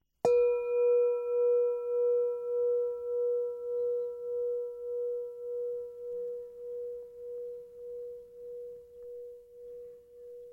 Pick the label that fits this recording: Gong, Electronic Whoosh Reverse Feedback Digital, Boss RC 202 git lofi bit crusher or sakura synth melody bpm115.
Gong